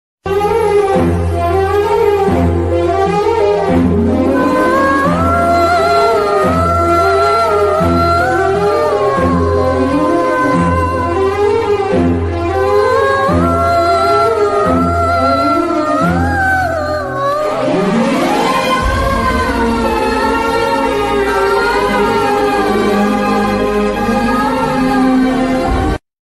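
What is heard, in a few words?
background music ringtone kannada ringtone kannada background music; raj movie; punithrajkumar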